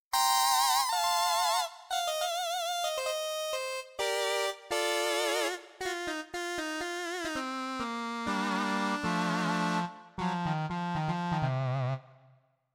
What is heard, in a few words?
Lead